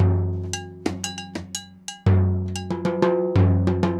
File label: timb cow 120, latin, 120bpm; Sound Effect Library